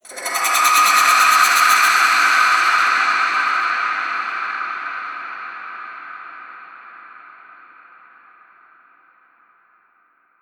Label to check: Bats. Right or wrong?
Right